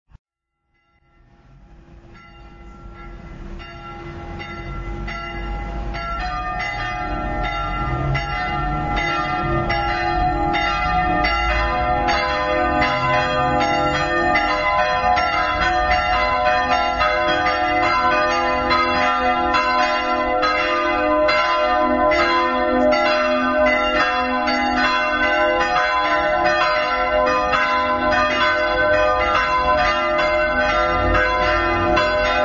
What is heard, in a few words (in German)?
glocken hospital